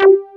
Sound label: RESO PLG BS, BASSES; Pro-Rec - Synthsonic